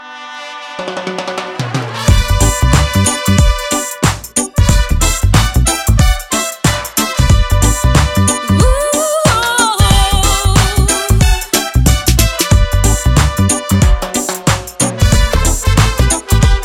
Drum Beats Ringtone

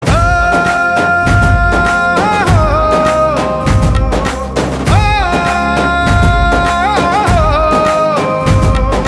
humming tone

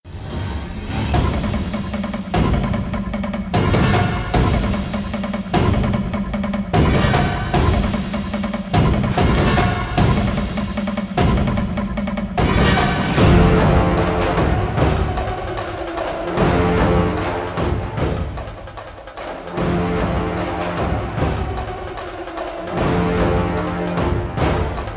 BGM

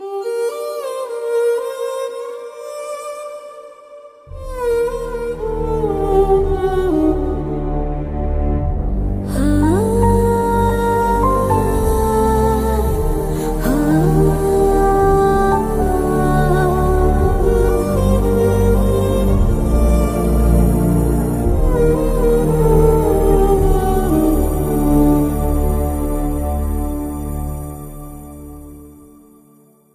Emotional BGM

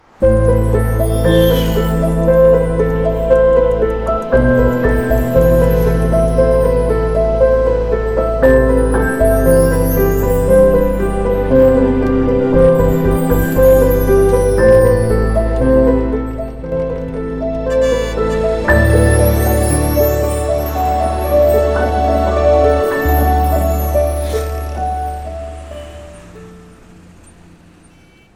Bike Gift BGM

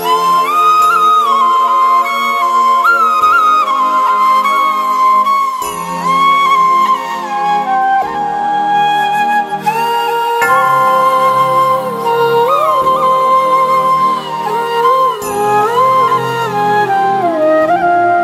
Flute Ringtone

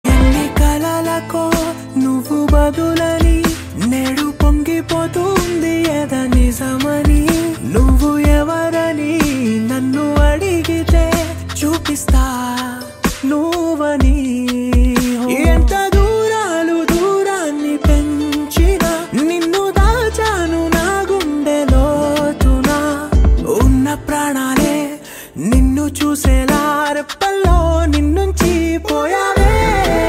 Love Ringtone

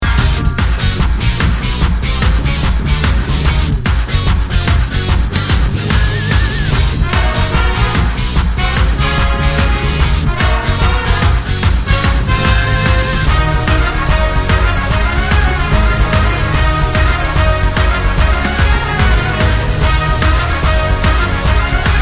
Drum Beats Ringtone

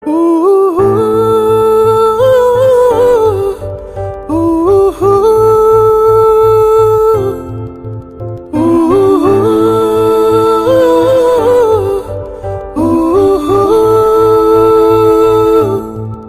Female Bgm

